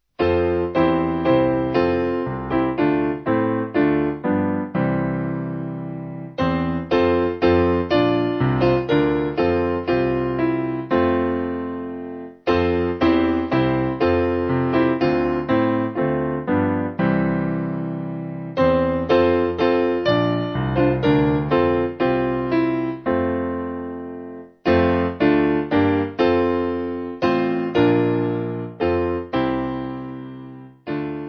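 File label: Piano, church music backing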